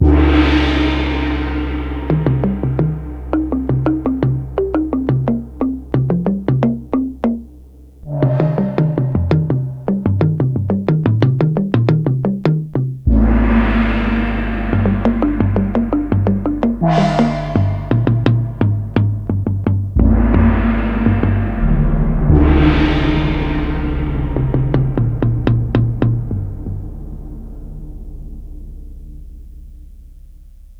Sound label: WIND GONG SLIT DRUM